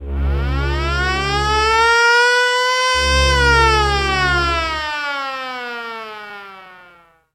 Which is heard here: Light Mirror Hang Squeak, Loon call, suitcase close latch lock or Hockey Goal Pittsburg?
Hockey Goal Pittsburg